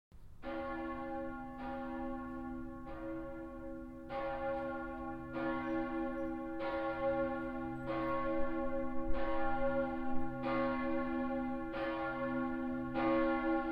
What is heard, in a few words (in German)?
osannaglocke